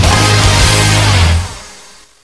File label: score sound; XDM